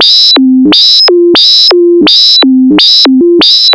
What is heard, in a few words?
ALARMING, SCI FI ALARMS; Zero-G; Ambient 1